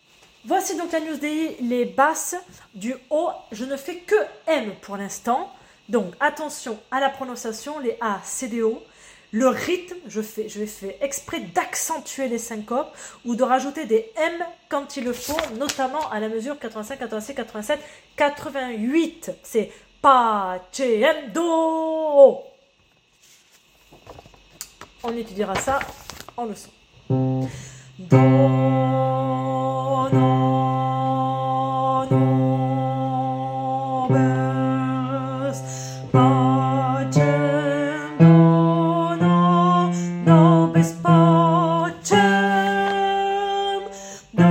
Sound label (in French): basse haut; Agnus dei suite M